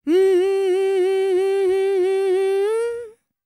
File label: World Voices, INDIA; ILIO; Vocal Planet